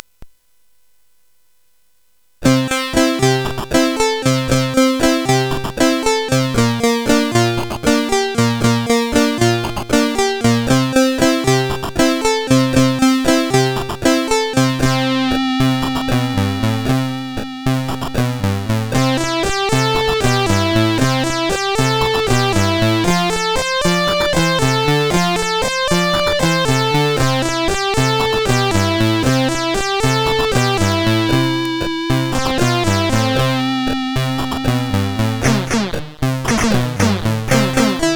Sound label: Little Song, CSG8580R5; hvsc